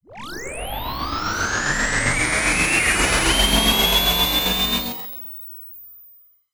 MACHINE Medium Power Up; Cielonos

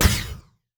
shoot laser; Cielonos

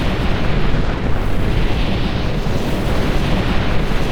Fire Magic Flame Thrower Loop; RPG3; Cielonos; Wwise

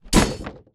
General Melee Impact; Continentis

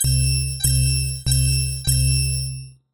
COMPUTER Alarm; Cielonos; Wwise

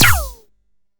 projectile shot; Hovl Studio

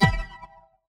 light impact; MagicArsenal

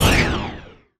arcane impact; MagicArsenal

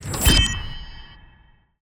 Spear SKILL cast; Cielonos

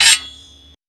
Sword Slash; Continentis

